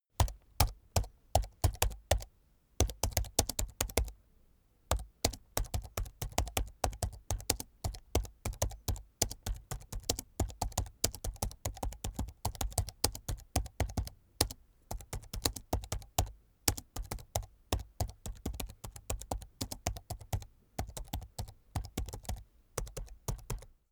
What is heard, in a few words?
keyboard laptop type sequence slow hard